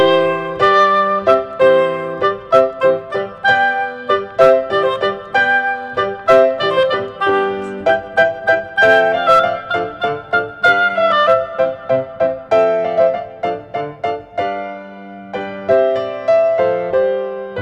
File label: Hornpipe; Wedding